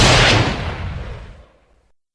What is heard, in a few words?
Luger P08 Firing